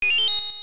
Get Item; Get a larger item (secret seashell, fairy, etc.)

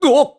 Slide; Link; zelda sounds; Skyward Sword

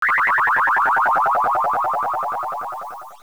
Die, dying; Link dying (edited to remove clicks); AOL

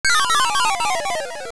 Kinstone Spin; Kinstone spinning after fusion (used in Four Swords for exiting an area)

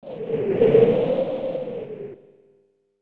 Tornado Appear

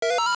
Turtle Rock Blink; Turtle Rock blinking

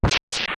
Shovel; Dig with the shovel; Zelda

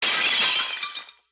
Pot Shatter; A pot shattering; The Wind Waker; Zelda

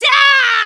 Spin Attack; Link's spin-attack shouts

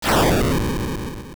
Explode; Turtle Rock exploding after being defeated (similar to Bomb Exploding but less "sudden"); zelda

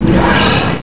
Bound Chest Spirits Noise

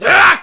Gauntlet Attack; Byrne's gauntlet attack; zelda

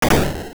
Walk; Aquamentus walking (repeats every 0.4 seconds normally, 0.3 seconds when walking fast); Oracle

